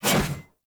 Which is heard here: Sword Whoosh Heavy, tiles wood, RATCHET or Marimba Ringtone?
Sword Whoosh Heavy